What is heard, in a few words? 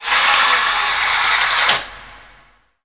Curtain; Playable Tutorial Level; TR2